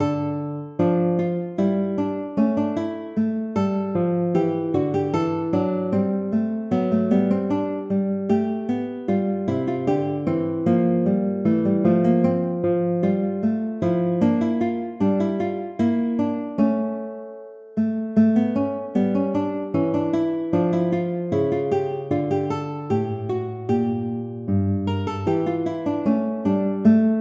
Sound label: air, hornpipe